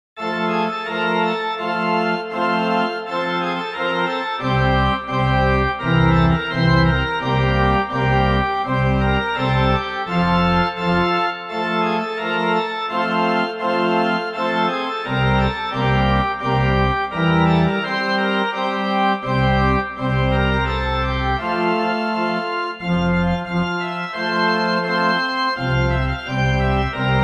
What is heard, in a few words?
midi